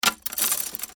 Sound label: Wood Debris Foley Small Pieces Falling On Cement; Materials